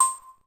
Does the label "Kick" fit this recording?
No